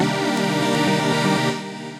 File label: Hard Stab, Synth Lead; Ableton; Skitter and Step